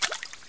player slosh

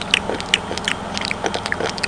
quick slurping; People

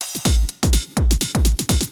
drum loop house 125; eJay

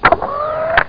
Bullet spin

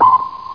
ping; ircleUS

